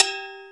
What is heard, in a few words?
R8 CB